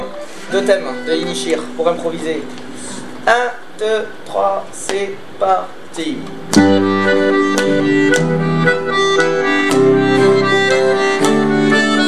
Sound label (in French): Mazurka irlandaise impro sur 2 themes; Inisheer